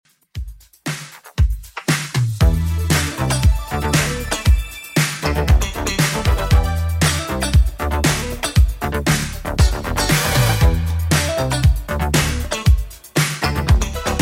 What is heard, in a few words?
80s Redrum 117bpm